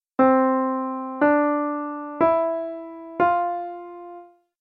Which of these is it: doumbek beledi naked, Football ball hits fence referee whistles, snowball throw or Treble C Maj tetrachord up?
Treble C Maj tetrachord up